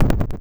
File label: stump hit